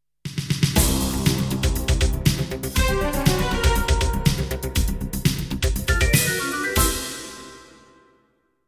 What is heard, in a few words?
jingles; 90 DDD Jingles